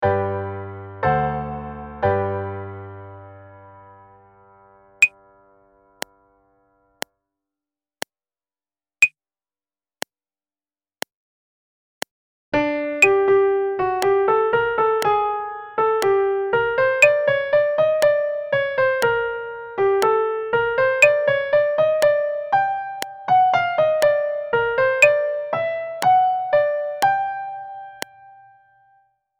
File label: Prepared Melody; MUS 240 Practice Sheet 1; advanced musicianship